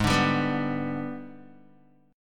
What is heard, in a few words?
Ab Minor Major 7th Flat 5th, down, Guitar